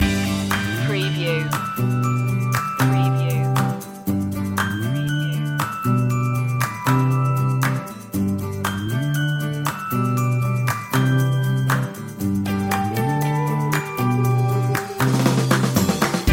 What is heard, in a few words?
just smile loop